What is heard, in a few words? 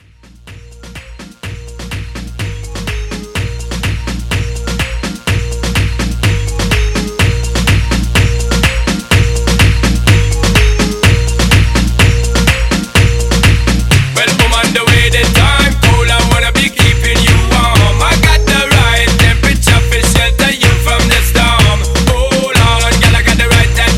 Tribal Re Drum